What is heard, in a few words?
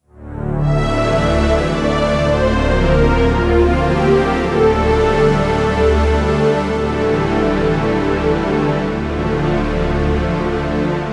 Funeral Game Over; terra diver